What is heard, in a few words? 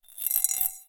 Spin In Glass, Medium Wine Glass